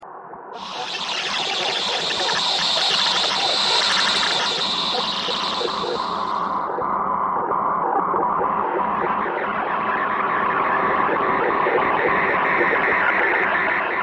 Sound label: weatherradio thread noise